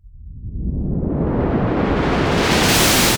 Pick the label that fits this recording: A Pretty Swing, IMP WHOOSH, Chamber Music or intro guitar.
IMP WHOOSH